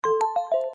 bounce

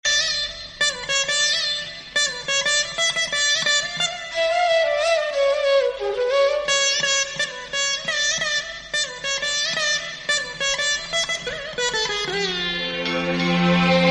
feeling kannada om om tune

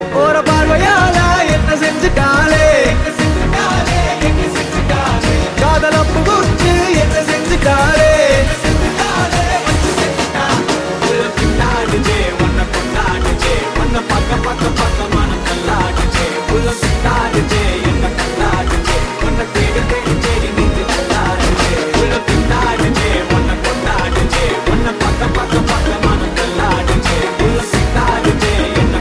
tamil tune

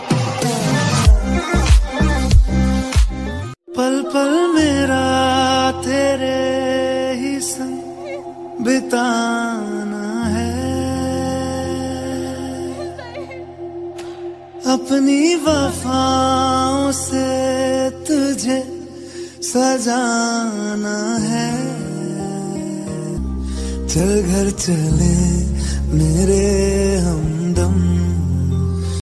remix marathi

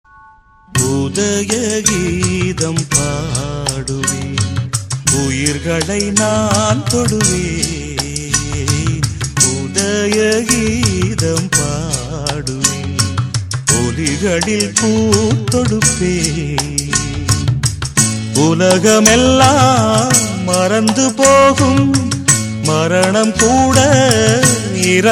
love tamil ring

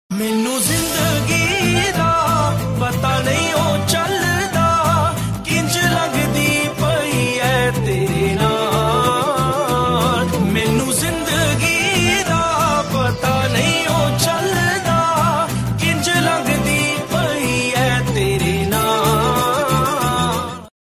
punjabi

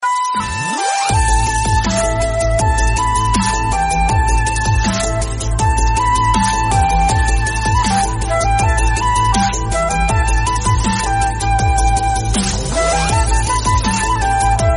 flute dubstep

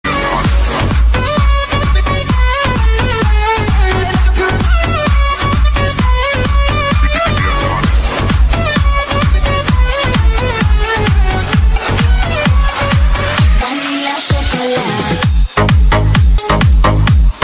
party mix; iphone7

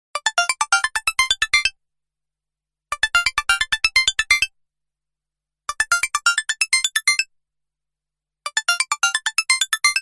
chatter; nokia

